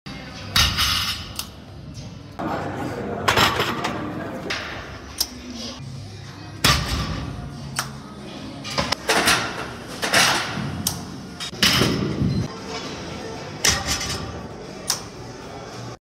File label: Weightlifting; وزنه برداری